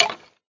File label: skeleton hurt, mob; Eaglercraft; eaglercraft-b1.3